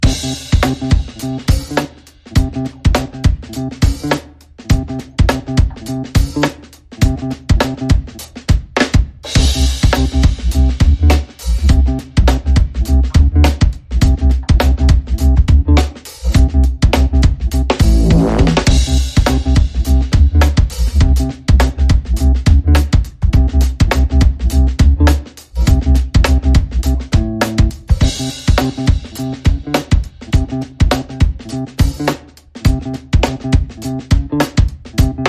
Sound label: Drum & Bass Only